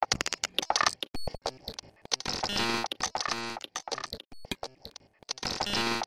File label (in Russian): kliki bistrie udari